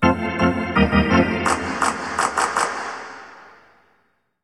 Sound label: Hockey Organ